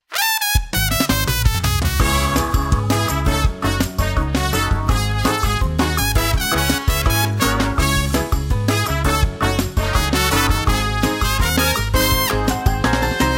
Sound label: INCIDENTAL MUSIC